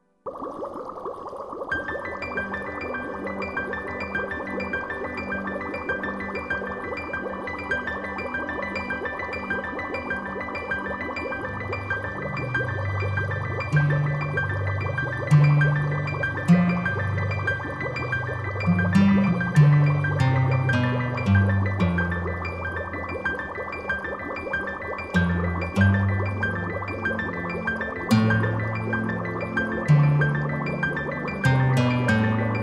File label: Waves